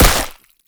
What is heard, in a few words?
break flesh small; physics; breaking